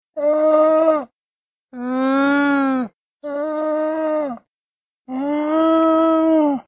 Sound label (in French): Cri d'élan